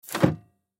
Semi Truck Parking Brake Push Hard Release Air; Trucks; Industry

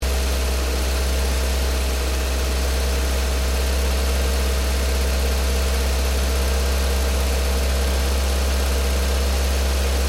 Car Ford Focus Idle Medium Engine Bay

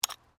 Digging Small Handheld Tool Rocks

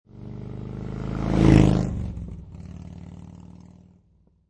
Motorcycle Harley Davidson Drive By Left To Right

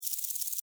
Insect Rattle Shake, Insect, Rattle, Shake, Bug; Animals:Insects; Insect Movement; Blastwave

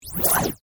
Tape Rewind; Tape Players (Sound Effects:Electronics); BlastWave

